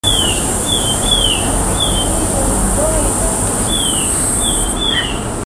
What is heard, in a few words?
new call